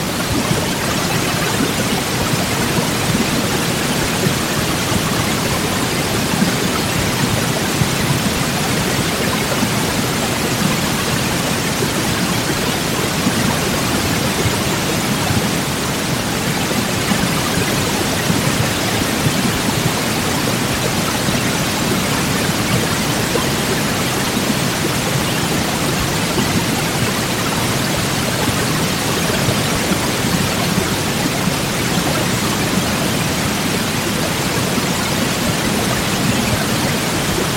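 noise-suppressed static background noise quite loud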